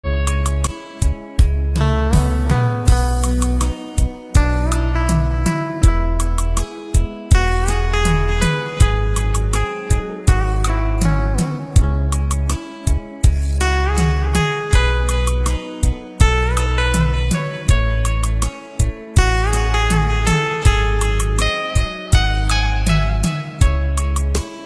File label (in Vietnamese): Hòa Tấu Rumba Không Lời Guitar